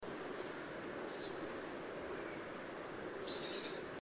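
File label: crested tit mitratus flight call; prerow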